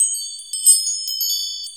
WIND CHIMES, 12-Bit Drums; Hip Hop; E-MU Formula 4000 Series Vol. 1; Hip Hop Nation

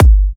G, Kicks; markus hakala

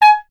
Clarinet G#0; Miroslav Vitous - Solo Instruments VOL-1

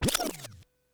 SHORT SCRA, Vinyl Scratches

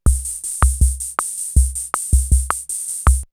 CR78 R77 BeatBox, drumloops, DrumMachines

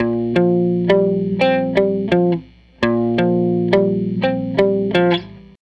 Strat Guitar Loop

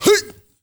MALE RAV, MALE NOISE, Heart of Africa

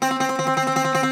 Greek Bazouki, Plucked Strings

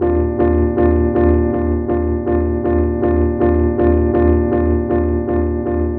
Thrummer 170 G#min; Drum & Bass; Total Drum&Bass

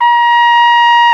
BRS CORNET; Roland L-CD702; Orch.Ensembl

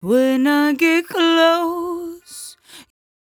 57bpm Am, Velvet Vocal; Zero-G

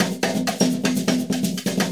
Percussion Loops, Brazilian; Houseworx

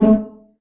STR PIZZ D#1 PP; Sound On Sound - Peter Siedleczek's Orchestra

